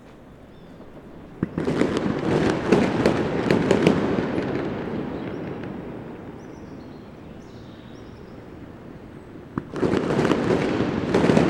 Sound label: fireworks dawn elections day, venezuela